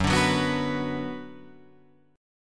F sharp Suspended 4th Sharp 5th F sharp Guitar Drop D